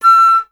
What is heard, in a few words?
flute E